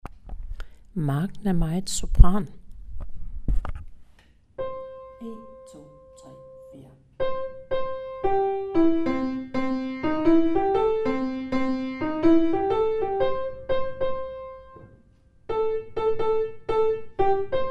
Sopran

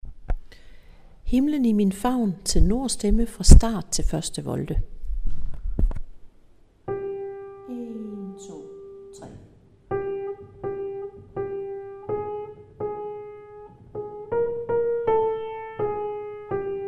Tenor

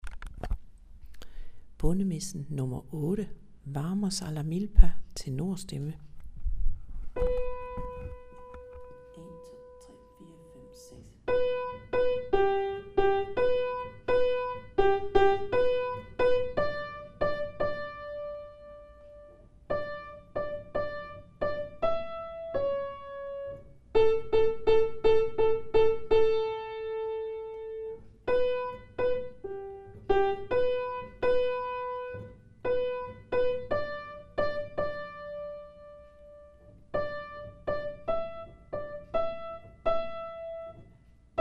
Tenor; øvestemmer

